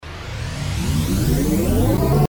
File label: gas Up; boxing